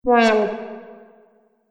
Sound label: Multimedia Rollover, mouseover sound; Andrew Fleming